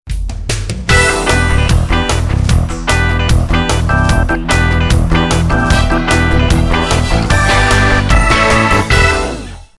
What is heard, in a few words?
POP soul